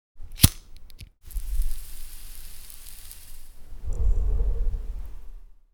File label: Cigarette